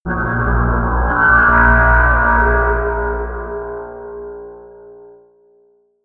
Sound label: scrapes tensing scariness, drones